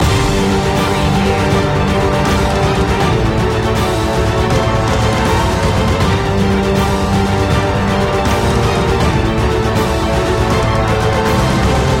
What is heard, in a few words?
land loop